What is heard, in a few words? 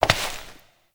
Step Rock; Warframe; Koumei